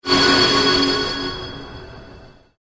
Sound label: pulse fade, Sound effects created by manipulating other sounds; Rises and Dives